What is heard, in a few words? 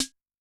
Snare rim; Yamaha DTXpress-II